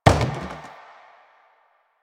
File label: Plastic Hit Short; Warframe; Nineteen Ninety Nine